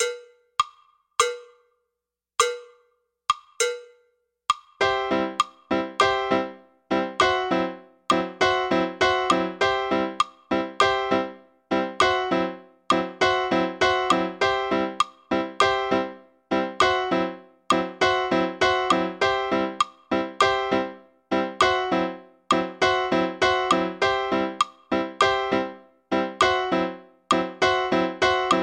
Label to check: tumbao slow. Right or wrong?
Right